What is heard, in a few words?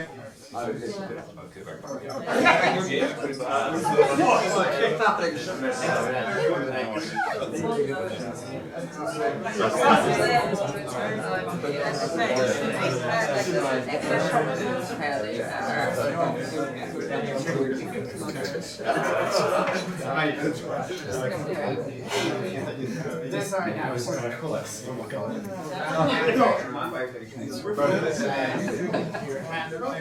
crowded room